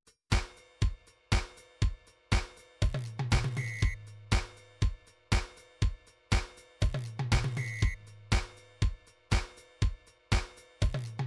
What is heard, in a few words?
drums, midi drums